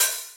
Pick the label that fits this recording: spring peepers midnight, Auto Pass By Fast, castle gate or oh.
oh